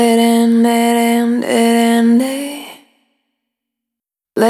Spin, Single Voice Melodic Loops

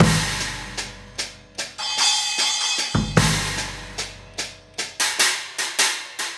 Hi Hat and Kick

